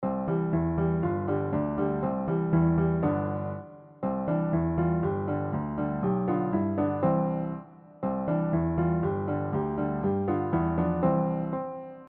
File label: instrumental; Kuca Dzek